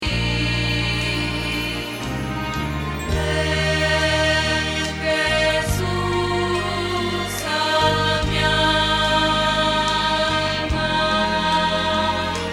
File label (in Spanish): Contralto